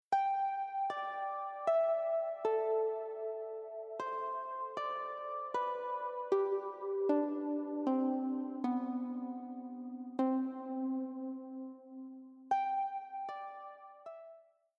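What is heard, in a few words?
Future Bass melody Pluck Comp On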